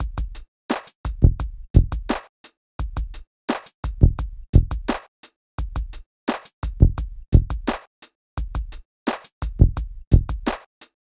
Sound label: Drums, Hip Hop